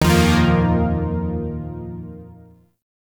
Shawzin Blues Chord; Zariman Shawzin

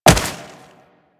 Wall Jump Snow; Footsteps; Warframe; Tenno; Wall Run

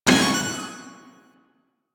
turnstile open; ambience; Warframe; Entrati

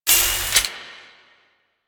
Flame Spear Ready; Grineer

